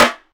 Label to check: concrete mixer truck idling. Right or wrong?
Wrong